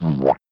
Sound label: mwop; twitch